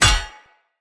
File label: Hammer Impact Shield; Weapon Impacts; Player; Combat; Tuvalkane Hammer